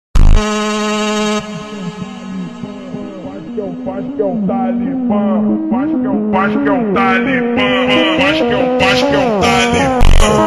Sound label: Ultra Slide Funk Super Slowed